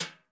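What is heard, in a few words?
brush soft; Northstar - New Gold VOL-2; 30S BRUSH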